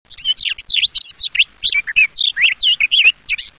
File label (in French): fauvette